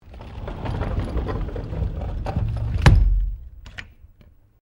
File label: Door Sliding Exterior Glass Heavy Close Latch; Various doors